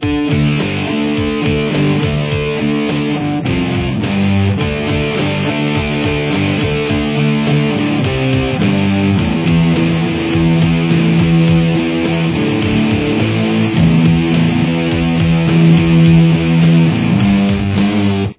prechorus, guitar

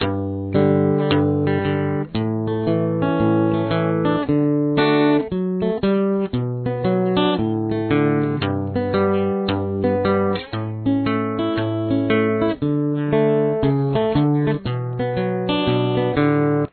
chorus, guitar

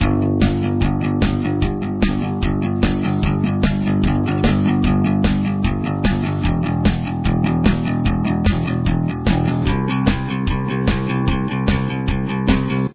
bass

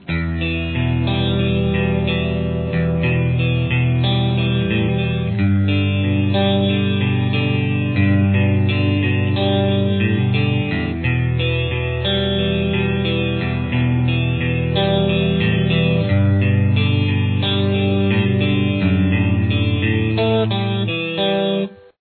guitar

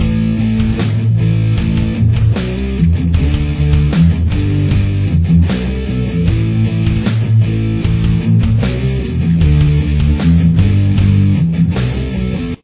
chorus, guitar

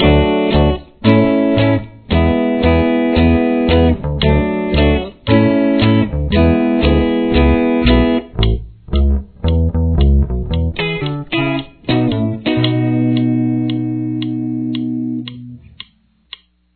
chorus one, guitar

